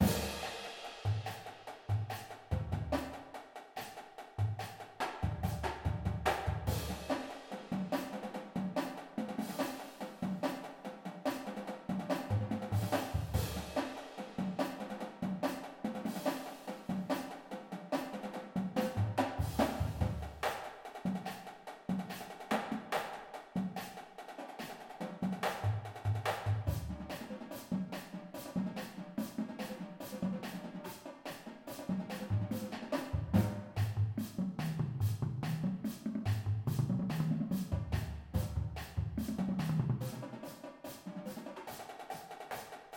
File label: full drumline